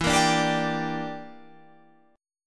E Minor 7th Flat 5th E; Em7b5; strummed; 12,13,12,12,15,15

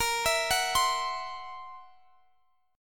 C 7th Flat 5th A sharp, chord

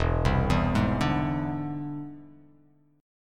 E Augmented 7th E down; E+7 arpeggiated; JGuitar

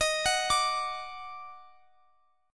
Eb Minor Major 7th, down, Guitar, Drop D

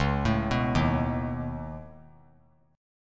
C sharp 7th Suspended 2nd Sharp 5th C sharp down; C#7sus2#5; arpeggiated; JGuitar

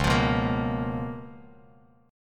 C sharp 13th, Bass Standard; strummed; C#13; JGuitar

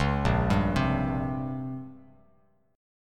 Bb Minor 6th Add 9th, Bass Standard; arpeggiated; JGuitar

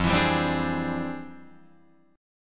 F Minor Major 13th, Guitar, DADGAD

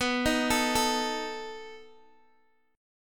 B 7th B down Guitar Open G

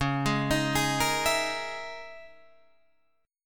C sharp Minor 6th Add 9th, Guitar, Drop D; 11,11,13,13,11,12

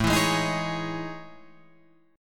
A Major Sharp 11th

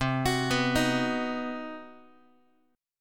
C Minor Major 7th Flat 5th, Guitar; arpeggiated; 4A,4E,5A,5D,5G#,6C#; JGuitar

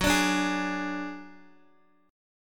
F sharp Suspended 2nd Sharp 5th, Guitar, DADGAD

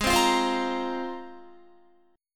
G sharp 6th Add 9th, Guitar; G#6add9; strummed; 15,15,17,17,17,18

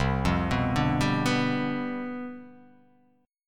C Major 7th Suspended 2nd Suspended 4th

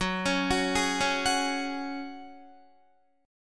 Gb Suspended 2nd, Guitar 4E,4A,5D,5G,5C,6F; arpeggiated

